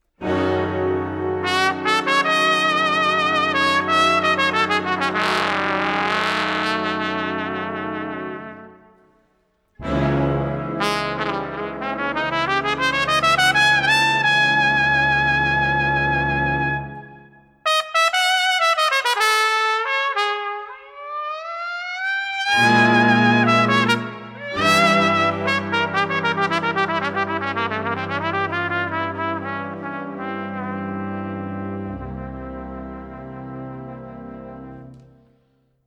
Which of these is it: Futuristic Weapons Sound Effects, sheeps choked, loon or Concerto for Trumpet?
Concerto for Trumpet